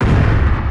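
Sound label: cannon fire distant; midnight guns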